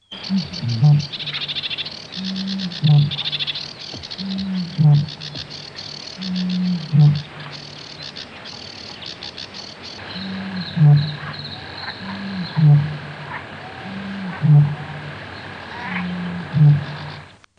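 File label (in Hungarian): Bölömbika; Madarak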